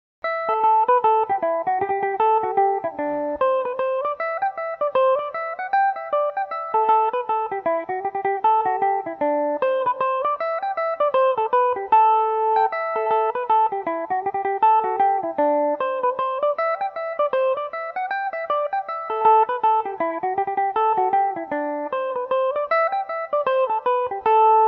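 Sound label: A dorian, irish mandolin; hunters purse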